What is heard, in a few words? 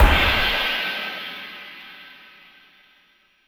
Crash, Dance, Beats